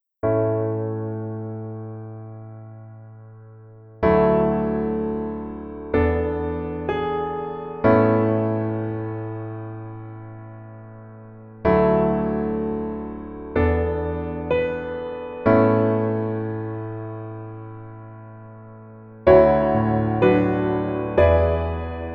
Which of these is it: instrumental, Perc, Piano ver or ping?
Piano ver